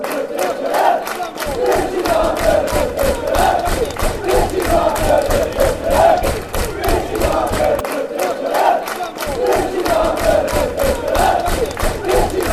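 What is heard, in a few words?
fanchants, football chants; richie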